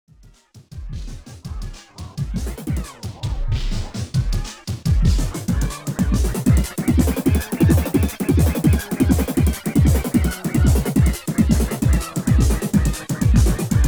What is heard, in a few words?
Bass; Bass-Fu